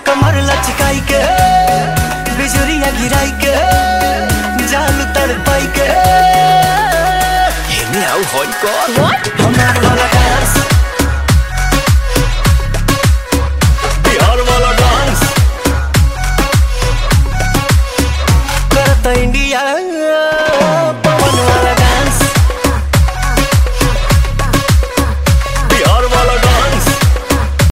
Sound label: dance ringtone